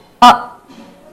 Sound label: "aahh"